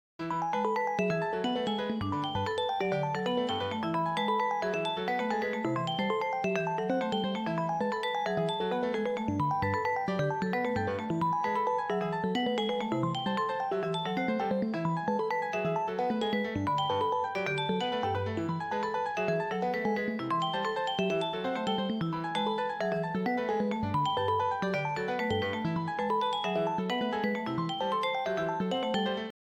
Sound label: harmony example